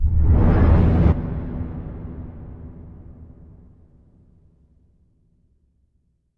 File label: Impact Wipe, Cinematic